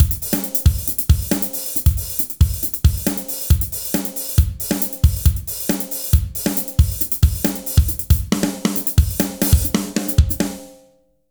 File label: Drums